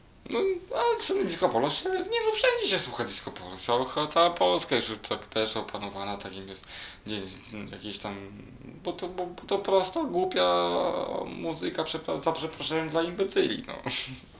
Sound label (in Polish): disco polo